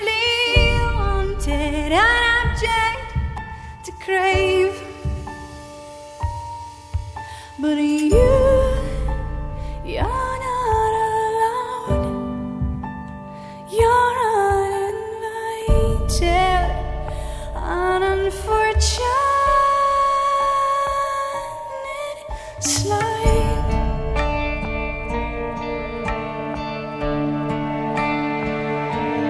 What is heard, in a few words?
pop